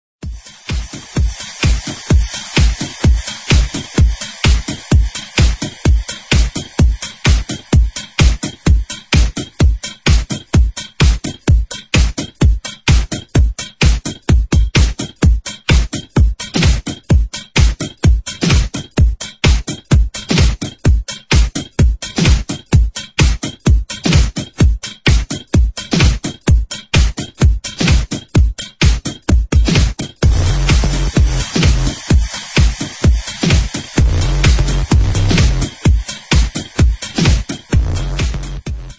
electro house mix

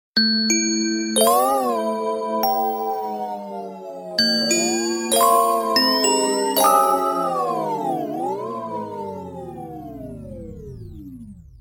broken lights sms

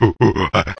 Gorilla; Kazooie

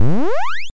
Bouncy Powerup

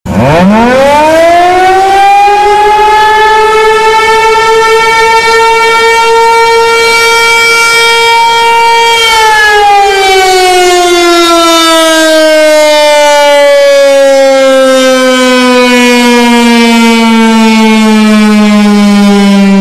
air raid siren; boss calling

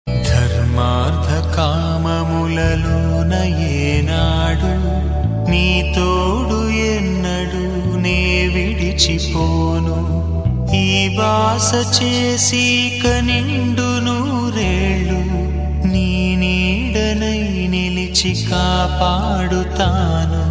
silent ring

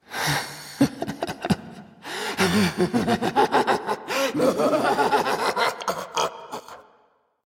man tormenting

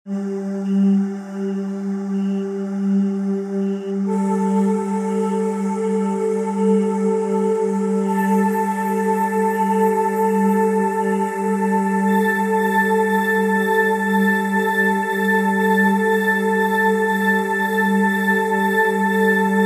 flute ascending

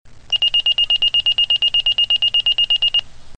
motorola tone

